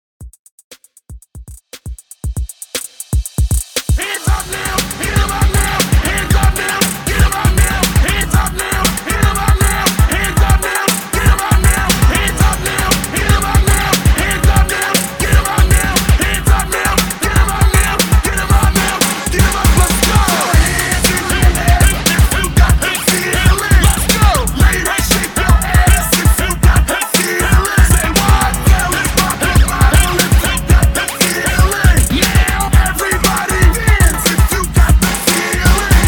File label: 90S HYPE REDRUM 118 BPM